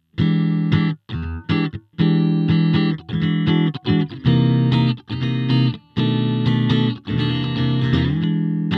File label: air clean